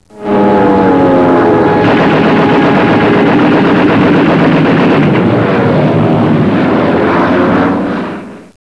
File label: aircraft; fb dii; warbirds